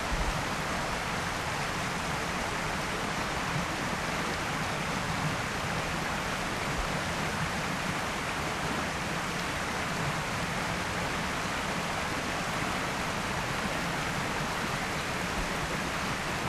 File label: stream; Titanfall 2